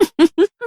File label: giggle